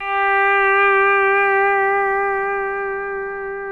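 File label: pedal steel swell G4; PEDSTL SWELL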